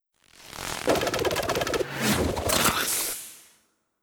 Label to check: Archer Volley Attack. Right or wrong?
Right